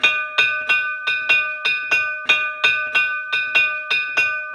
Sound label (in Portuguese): Sino de 15 kg Novo